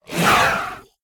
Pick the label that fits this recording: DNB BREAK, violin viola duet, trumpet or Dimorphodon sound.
Dimorphodon sound